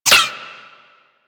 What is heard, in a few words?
Ricochet; Warframe; Cowgirl